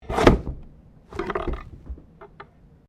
GUILATENE PAPER OPERATE, Office manually operated guilatene; Offices